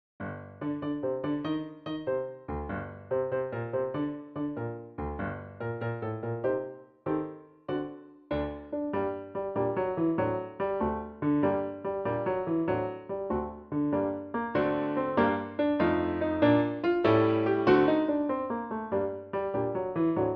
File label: March of the Marionette, Clarinet